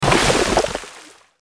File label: poison; big bug